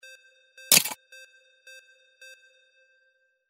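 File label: IMPACT ICE BREAK; windy beach audio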